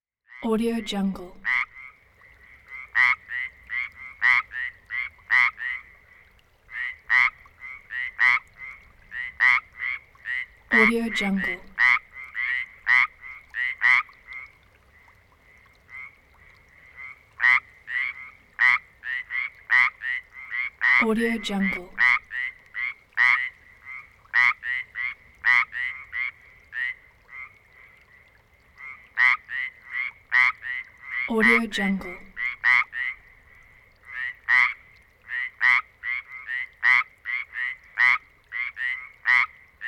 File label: frogs; capeaudio